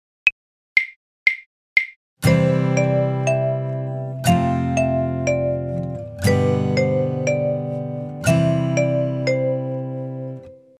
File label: chord and melody